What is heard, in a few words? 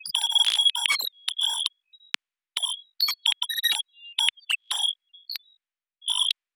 Glitch